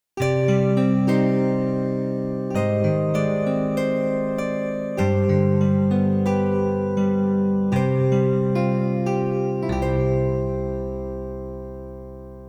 Game Over (Game Over); Hydlide Renewal

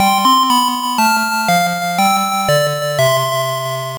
Lose; Lego Fun to Build; Pico

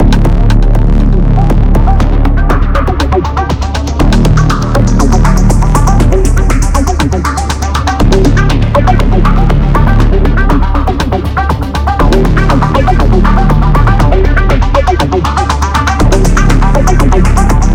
Music Electro Combat; Synthetik Legion Rising

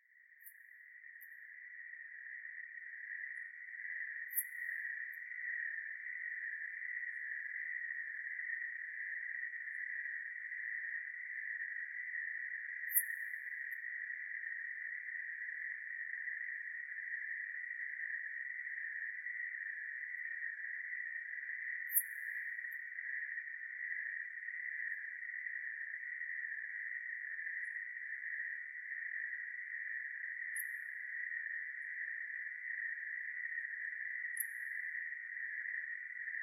Crickets; Ss Terra

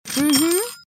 Upgrade Ummhmm

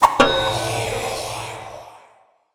Skill Magic

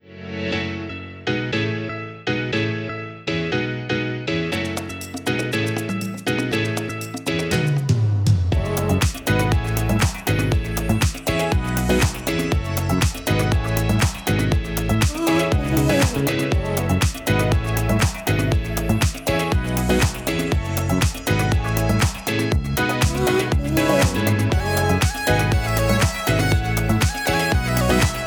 Electro-Pop; IKEA Smart Home Planner

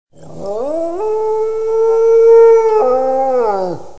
Wolves Howling; Joan of Arc

